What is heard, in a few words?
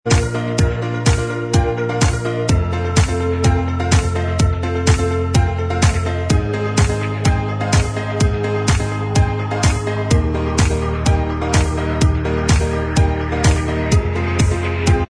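instrumental, backing tracks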